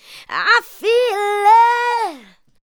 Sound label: ADLIBS 90; Ueberschall; Jam Box